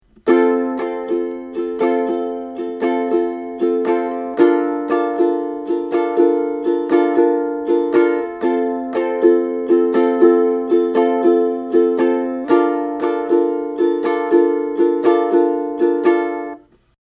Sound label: Strum, ukulele